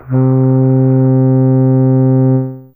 Saxy C3, Reeds; Fairlight CMI IIe

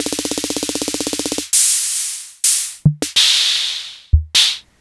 rhythm king, Samples Sliced; m8

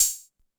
606 Combo Hat, drum kit; Concrete Collage Drum Kit; Concrete-606

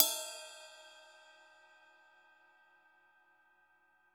CYM; Bob Clearmountain Drums I

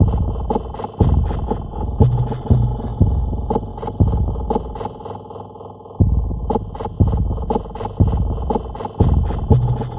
Dark Beat, Dark Organic Processing; Sonic Foundry (Sony Creative Software); Loop Noir

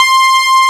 MOOG STRNG C4; AKAI S-Series CD-ROM Sound Library VOL-2; SYNTH

